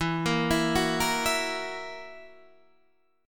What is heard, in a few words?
E Suspended 2nd Flat 5th E, chord